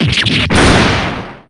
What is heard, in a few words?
break; patrick